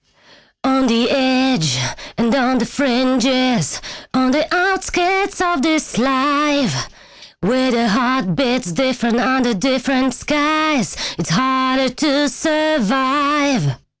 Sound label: vocals Old Mic Pre-Amp